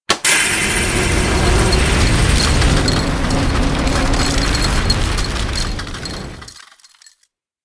Freezing spray trap; SyrinScape; Missing Magistrate

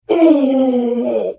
Hit; The Elder Scrolls III - Morrowind

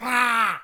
Goblin Scream; SyrinScape

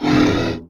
sludge; The Elder Scrolls III - Morrowind

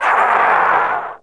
scrm; The Elder Scrolls III - Morrowind

